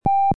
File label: Alert Tone; signals